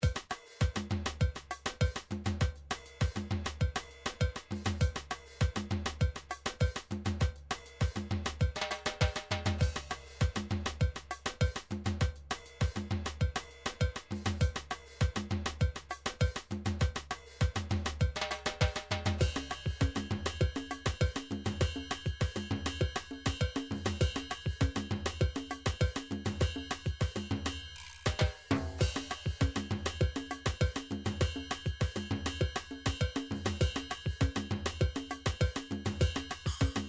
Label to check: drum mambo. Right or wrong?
Right